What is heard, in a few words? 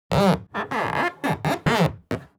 leather couch squeak; coil